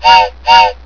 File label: toy train whistle